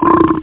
Alien At; Amiga Games 1996 #7; AlienSpace

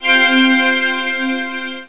Hand pad; meetmediaband

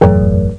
pound sound; Cloudz 1.0.3

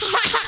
Get All Crystals; Ruckus

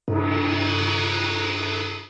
gong; Gizmo Project; sound emoticons

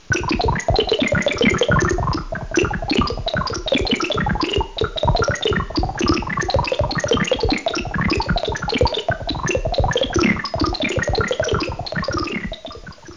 space bubble

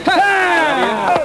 hahahh

